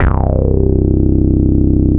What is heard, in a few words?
Yamaha AN1x Filtered Bass C4